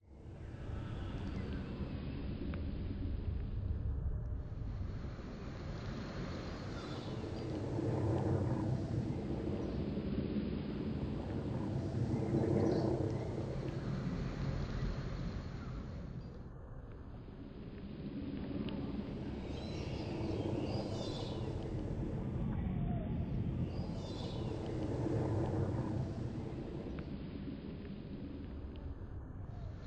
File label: noises ambient plane